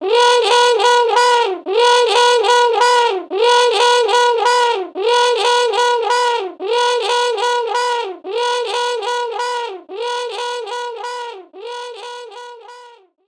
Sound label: Music Bayou Hungry frogs Flourish; the princess and the frog